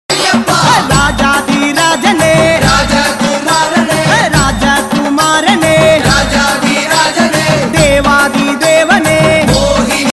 song in tamil; sabarimala channel

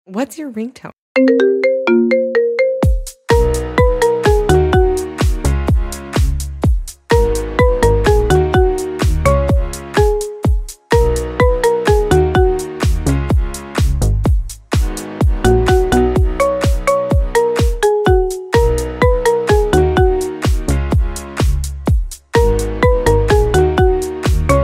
ringtone marimba remix ringtone; ios android

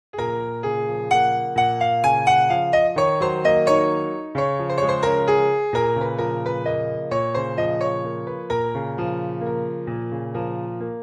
Bengali Durga puja ringtone

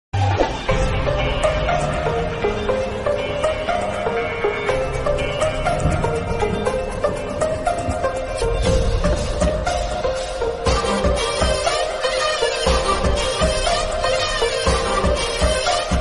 bgm; pudhupettai; dhanush

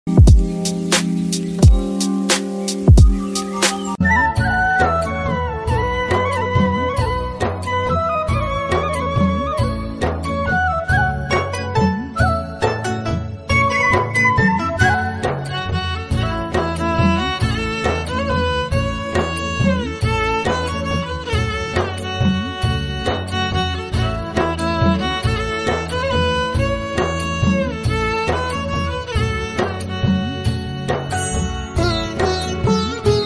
devotional flute instrumental ringtone

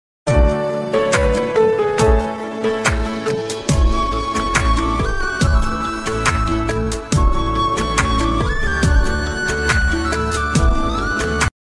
bhojpuri ringtone

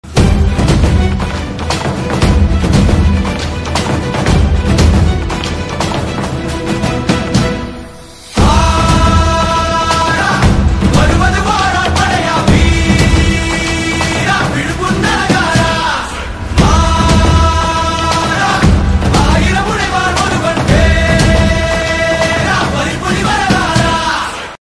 ringtone trending bgm ringtone

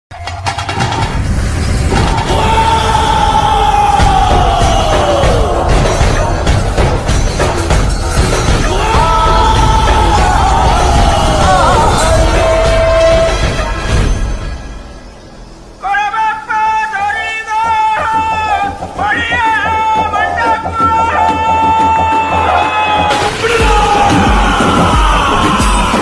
bgm bgm ringtone; kantara

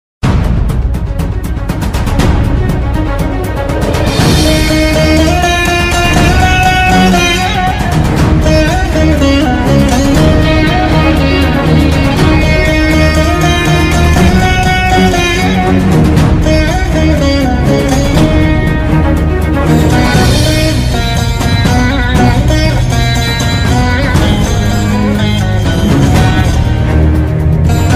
emotional climax bgm ringtone; taj mahal; riya sen; bharathiraja

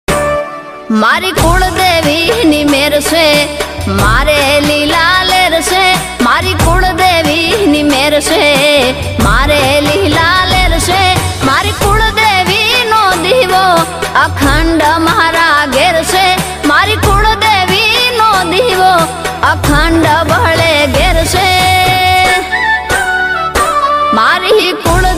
gujarati ringtone; kuldevi maa; કળદવ મ ગજરત રગટન; b r studio

